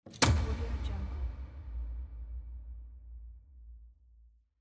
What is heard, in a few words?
big switch